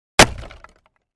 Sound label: Crate Palette Type 1 Hit with Sledge Hammer; boxes & crates